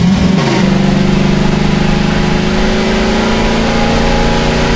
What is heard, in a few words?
third, v8; Source Engine; video game; vehicles